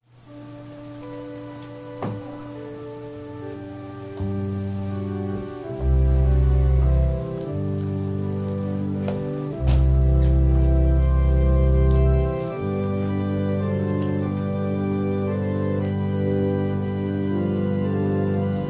Organ prelude; Sticks and stones

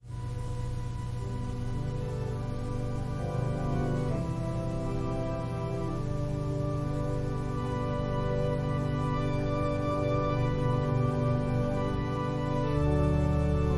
Organ prelude

